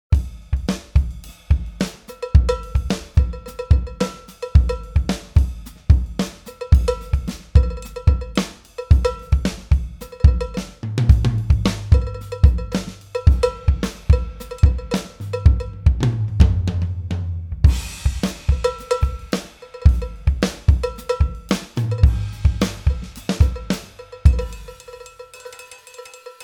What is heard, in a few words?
PEARL MimicPro Cowbell Groove